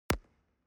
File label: object banger explosion bass